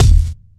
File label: wet steel kick drum sound D# key